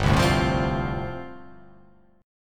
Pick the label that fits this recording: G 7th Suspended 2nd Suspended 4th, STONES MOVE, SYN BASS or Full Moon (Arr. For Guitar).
G 7th Suspended 2nd Suspended 4th